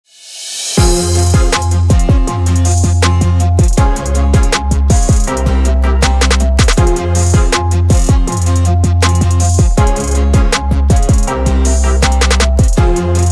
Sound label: background music for video hip hop version